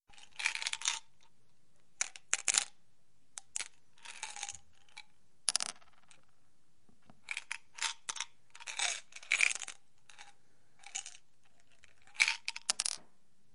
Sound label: Pills, clattering